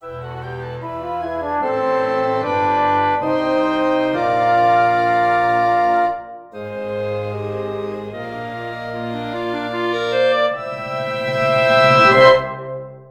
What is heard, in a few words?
Achievement